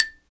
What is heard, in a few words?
XYLO, Afro Xylophn